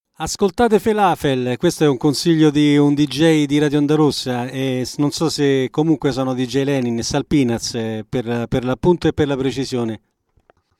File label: jingle; radio felafel